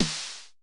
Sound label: snare, Roland MC-909